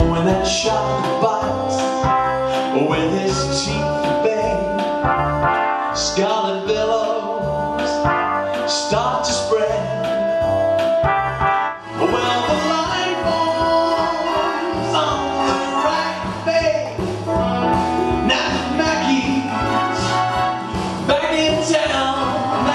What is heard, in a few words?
Male Singer